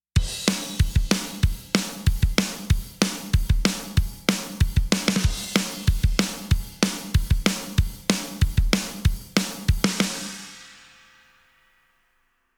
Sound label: drum On